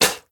defeat spit; smite; god arthur

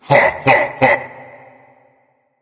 laugh; fenrir

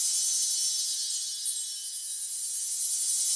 flame trail layer loop; Smite; Sol

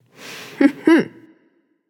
hehheh; smite; scylla

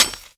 weapon stab ground; Smite; Sobek

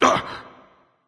nv surprised, vox; smite; voicepack; vulcan

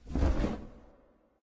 wingflap; smite; thanatos

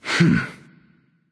lift; smite; voicepack

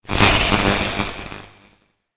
projectile; smite; god raijin

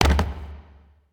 victory footfall; smite; chiron

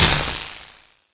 electricity; smite; chaac

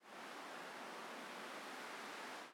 Defeat Wind; Smite; Susano

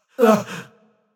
Surprised; smite; nezha

